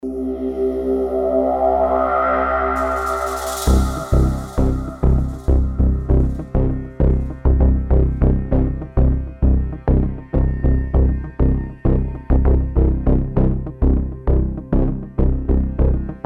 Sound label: Tension